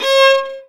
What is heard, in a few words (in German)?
GEIGE, Alpine Volksmusik